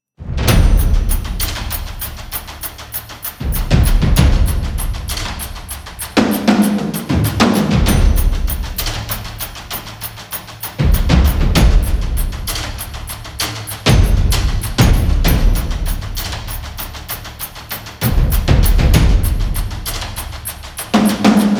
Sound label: Taiko